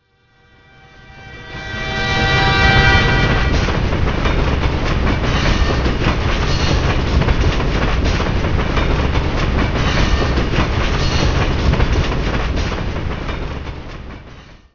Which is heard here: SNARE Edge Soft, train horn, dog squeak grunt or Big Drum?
train horn